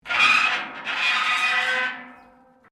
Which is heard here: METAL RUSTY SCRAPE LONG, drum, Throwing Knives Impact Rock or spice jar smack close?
METAL RUSTY SCRAPE LONG